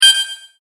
multimedia rollover, Multimedia Rollover Tone

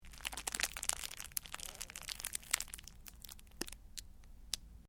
Cellophane crinkle; Material components for constructing more complex sounds; Plastic; Materials

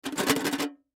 Tin Box Shake; Shaking (Sound Effects:Objects); Blastwave; Foley

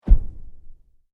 Sweep Mechanical Fast

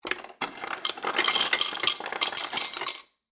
Household Ice Maker Dispense Ice Into Glass; Appliance Sound Effects

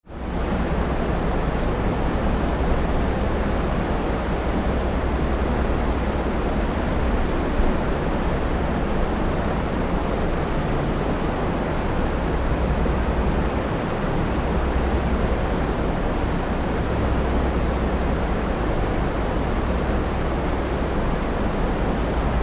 Ship Large Ferry Deck Atmosphere under Sail; Tom Hutchings

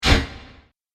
Multimedia Swipe, transition; Transition Sound Effects

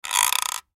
Metal Hinge Object Device Rattle Scrape; Foley Metal Hinge Object Device Rattle Scrape Movement; Metal Doors; Blastwave

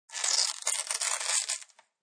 Velcro Rip, Tearing Velcro sound; Ripping; Objects

